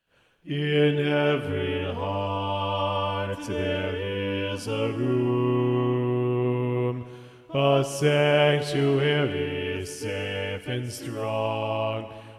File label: Bass 2